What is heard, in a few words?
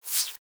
Foley Idle Lick Furr; Enemies; Warframe; Catbrow